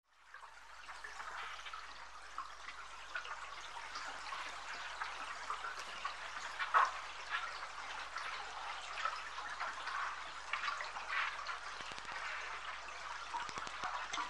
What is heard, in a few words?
underwater ambience, recorded in Peru at the confluence